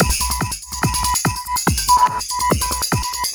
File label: Melded, Drums Beats